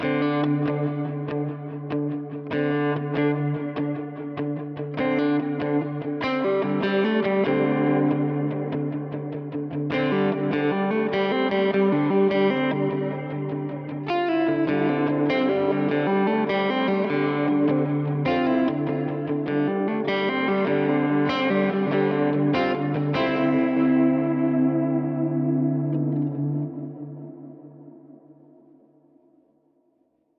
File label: Reverb Magneto